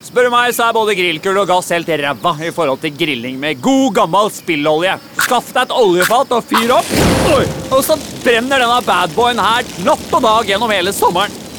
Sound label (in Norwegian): Grill